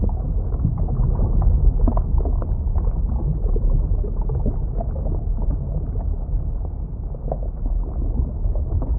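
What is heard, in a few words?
underwater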